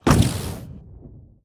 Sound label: shoot, shulker; Minecraft